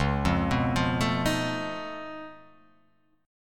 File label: C sharp Augmented 9th, C sharp, down